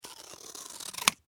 Office Scissors Cut Card Stock; SFX Bible 6

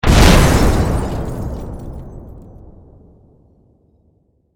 Explosion Debris Glass Wood

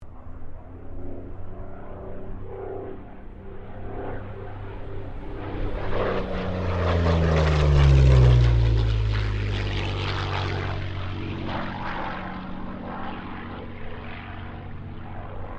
AIRCRAFT P-40 KITTYHAWK PASS STEREO

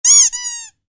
Squeak, Squeezy Dog Toy; Miscellaneous Toy Sound Effects; Miscellaneous Toy (Sound Effects:Toys); Squeaky toy

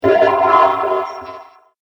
Space Impact Strike; Sci Fi Sound Effects

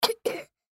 Human Cough; Cough Male Two Yrs Boy; Human Cough Male Two Year Old Boy; Sick; Sound Effects:Human

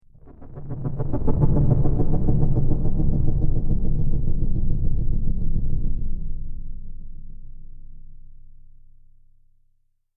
Futuristic swell transition; Swells; Sound Design

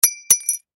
Bell Drop; Drops; Foley

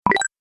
Pickup Points, video game sound effects

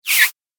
Chirpy Whoosh; sound design

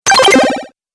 Arcade 8Bit Robot Fx, video game

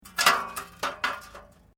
Metal Light Movement Minor; Materials

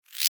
multimedia max min interface sound electric max; Multimedia Interface ' Maximise ' Sound ( Electric Style Tone ) Ideal For Maximising Of Br; Tones; Multimedia ’Maximize’ Sound; Sound Design; McKinneySound

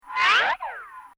Comedy Effect Slide; Funny Sounds; Bobby Cole

